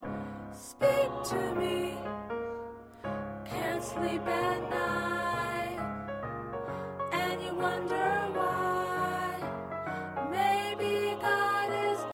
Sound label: Soprano